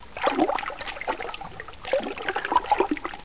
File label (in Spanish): nadando